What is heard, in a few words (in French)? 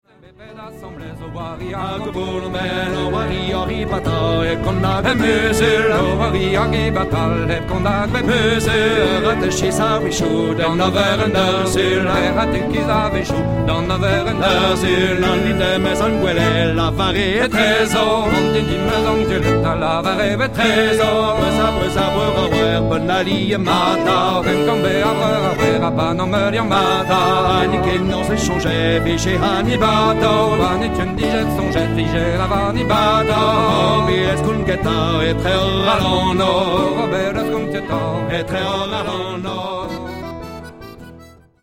Gavotte ton simple